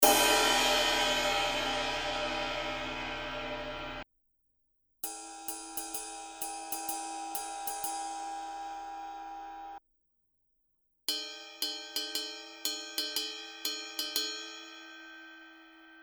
zildjian 22 inch a custom ping ride crash ride bell